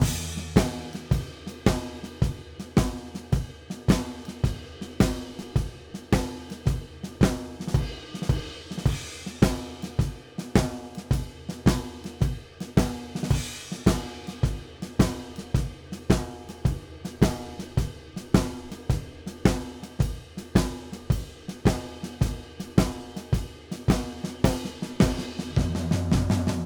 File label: Blues Drums; Digidesign; Pro Tools Essential Loops